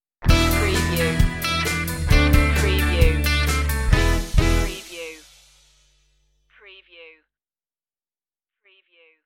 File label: cowboy kartin stinger